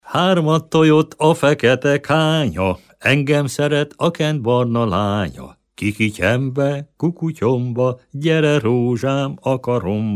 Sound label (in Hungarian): nepdal; harmat töjött